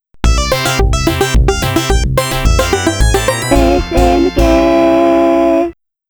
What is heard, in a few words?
Sound Logo; snk arcade sound digital collection vol. 01